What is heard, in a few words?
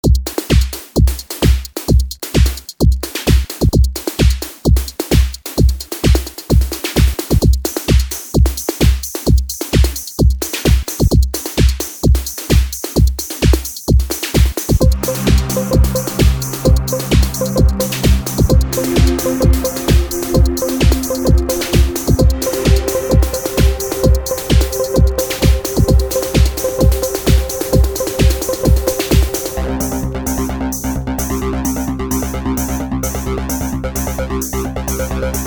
dance drums; microq; microq midisounds; dance&techno set